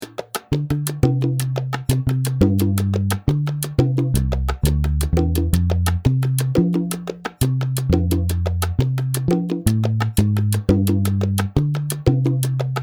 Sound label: base SALSA 2-3 Gm F NO PIANO